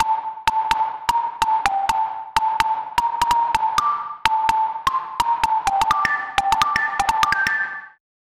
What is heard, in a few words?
Melody Pluck Big Room; midi wizard